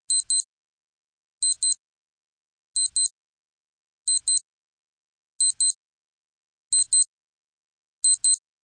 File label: Beeps; 헐리웃특수효과음; 신호-경적